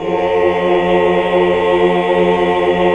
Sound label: AAH, AAH OCT; Best Service; Hallelujah